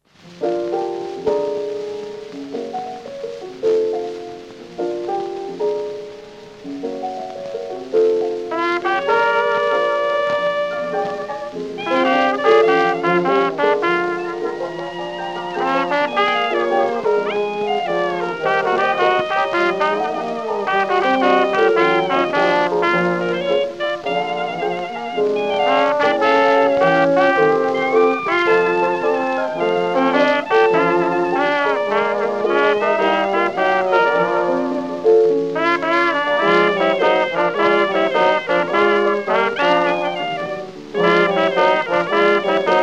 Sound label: blues